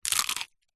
human eat bite crunch papadum

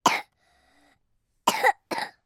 human 3 year old boy coughing

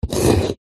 foley wood scrape sharp knife score single longer; zapsplat

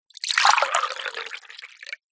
food drink still pour from jug into small glass; zapsplat

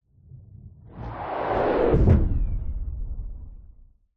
sound design whoosh fast pass impact boom; zapsplat

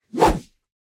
Swoosh Rod Pole; Just Whoosh 3; soundbits

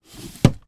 household bedside cabinet drawer shut

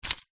vehicles keys car pick up from surface

